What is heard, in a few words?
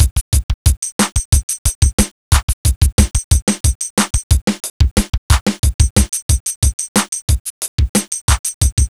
Drum